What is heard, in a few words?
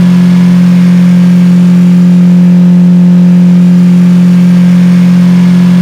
seat off mid